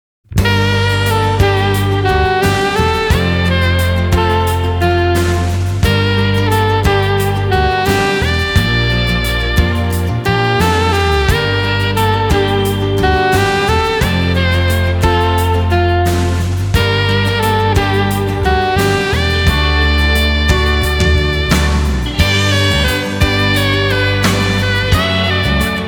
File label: emotion moving sax solo; kando no sakusu soro